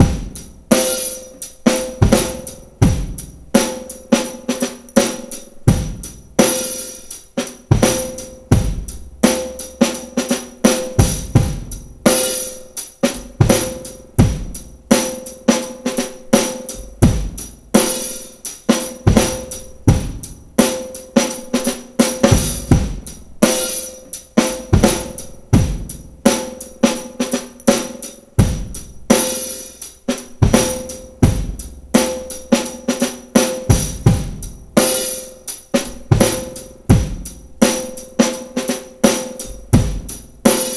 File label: 84.6 bpm, Breaks; SPRINGTIME; 90 s Sample CDs; Planet of the Breaks